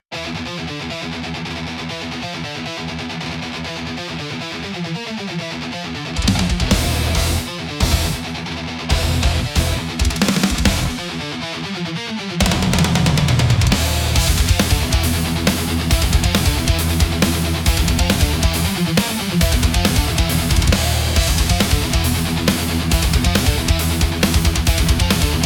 storm of hammers, epic metal rise soundtrack, heavy metal